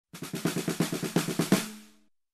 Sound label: Loose Snare Fill